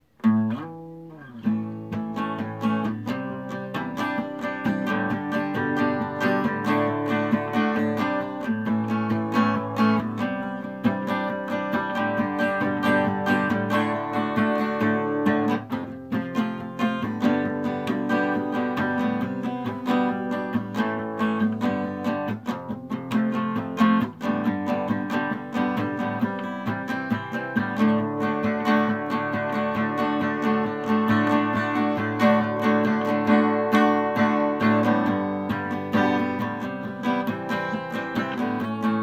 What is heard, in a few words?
acoustic; las haciendas mexican bar and grill